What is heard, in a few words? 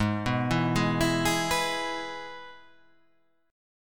G sharp Minor Sharp 5th; x,11,9,9,8,11,10,8